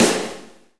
normal hit clap

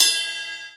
Ride

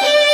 violin; osu

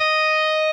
solo

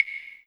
soft hit normal

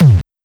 Filtered Kick G#

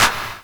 clap clap

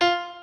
piano

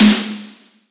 soft hit clap

